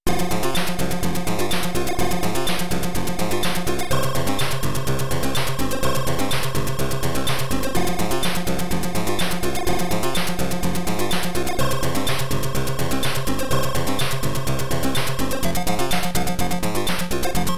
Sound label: atari 800 emulator; red max